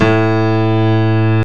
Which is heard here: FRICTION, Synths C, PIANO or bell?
PIANO